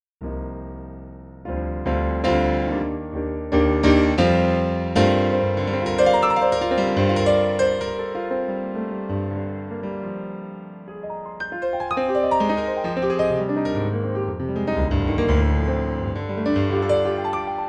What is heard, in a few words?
Roland Pia